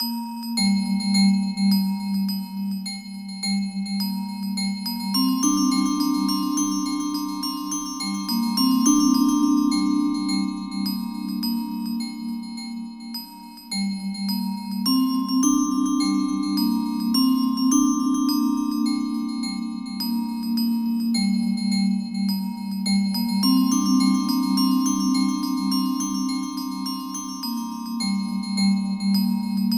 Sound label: Smog Bells